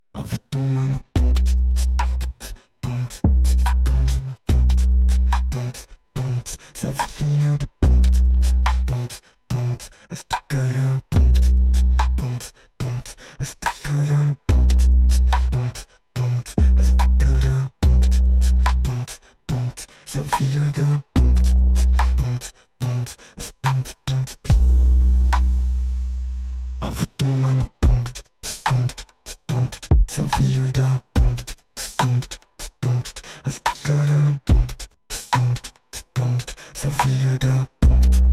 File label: Bass Boost